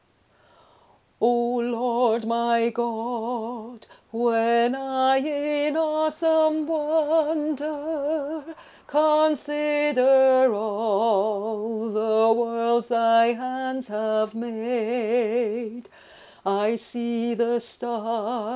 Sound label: Bass, choir